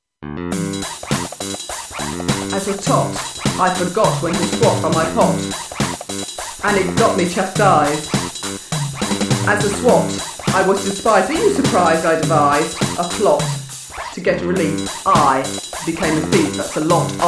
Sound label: rap, mono